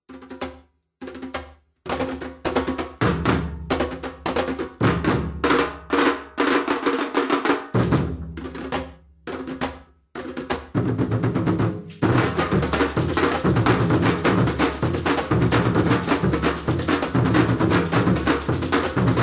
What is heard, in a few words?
Merengue Timbalada